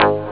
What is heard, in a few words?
electro, synth